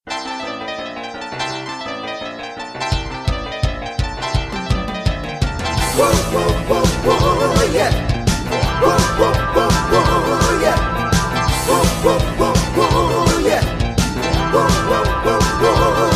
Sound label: Boogie